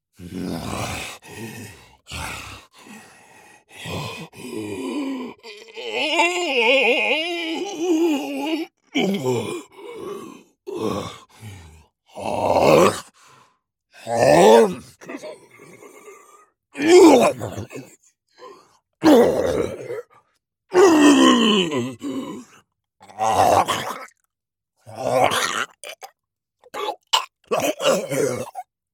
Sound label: gaming zombies